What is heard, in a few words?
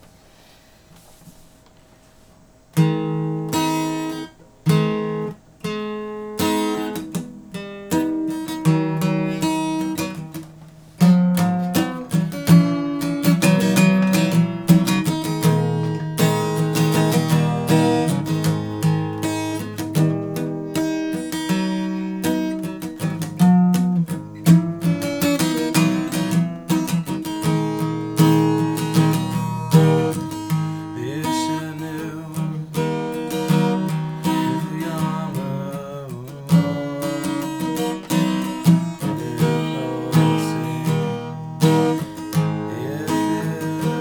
New Song Idea Am